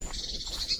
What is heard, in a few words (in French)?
mesange bleue